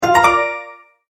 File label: multimedia tone start